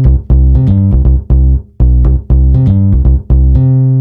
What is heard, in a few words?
Acoustic Rocker; Бас сэмпл; Rock Alternative; 120 BPM; loop; Bass